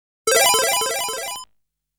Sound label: Continue countdown